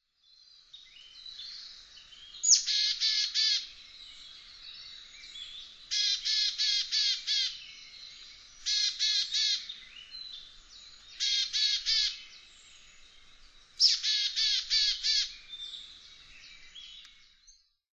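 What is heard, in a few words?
Willow Tit, Yaita; koe; kogara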